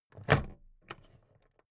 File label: plastic clasp open regular